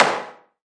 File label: snare